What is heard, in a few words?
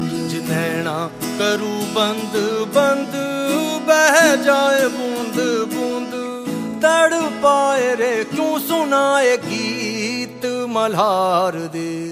Male